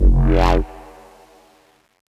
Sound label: Rough Cut Bass